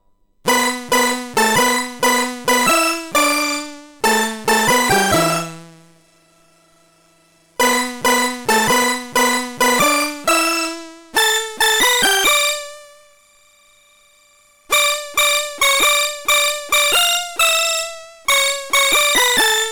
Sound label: C64 Synth